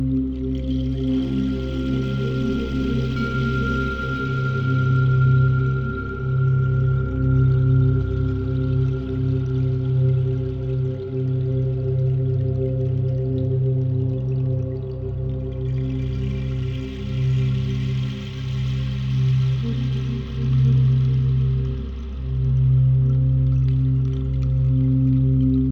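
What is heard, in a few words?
Scrolls Menu Ambient; Shadow Warrior 2 gamerip 2016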